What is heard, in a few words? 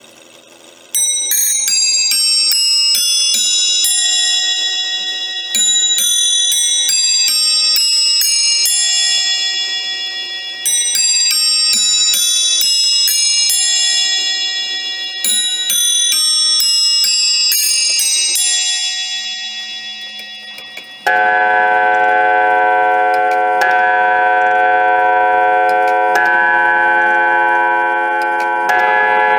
bells, clock